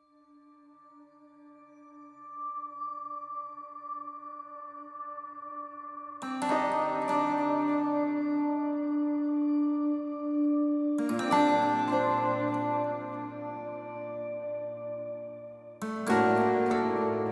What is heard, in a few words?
music intro; music 4-1 area 2 intro